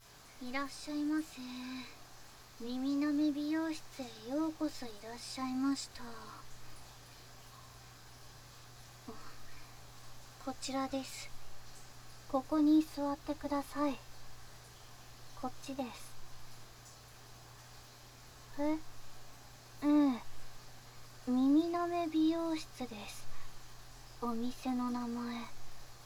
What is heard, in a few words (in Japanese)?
右耳奥舐めマッサージ, ASMR